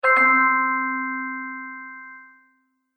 Ta da Chimes; Musical

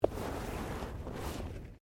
Body movement Clothing Foley; Body movement foley to simulate falls and deaths; Dressing; Human

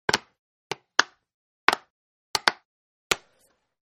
Dvd Box Case Close-5 Takes; Snaps; Dvd Case Close 02; Snap Sound Effects; Objects

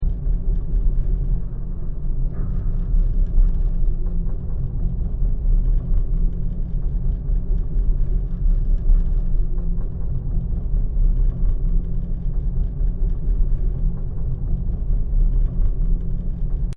Space Rumble Loop; Atmospheres; Sci Fi Sound Effects

